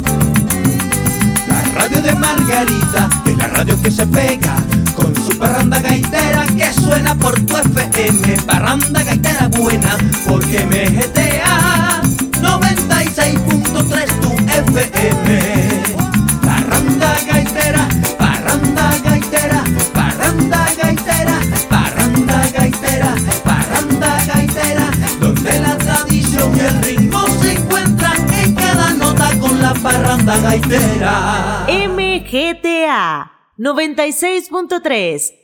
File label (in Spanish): Jingle Gaita; Parranda Gaitera